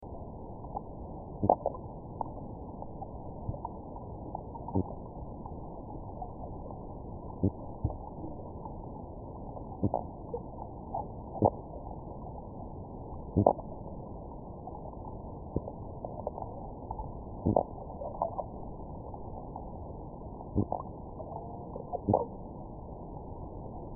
Megalodoras uranoscopus, Amazon, soniferous

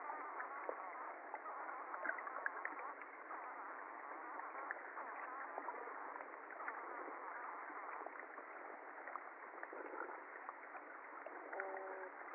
whinning burp, birdlike

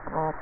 honk type a, fish

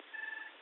echo high pitched; highpitched noise

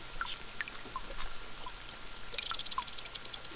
rattle like, clicks

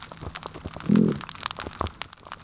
growl, soniferous; Gilligans Island

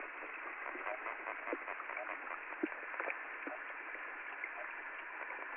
chattering, fish grunts and sonar

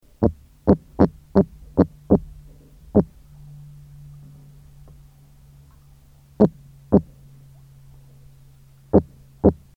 Prionotus evolans, soniferous Carolina fishes

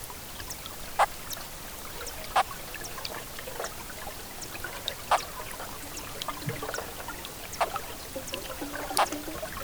Pimelodella sp B; Amazon

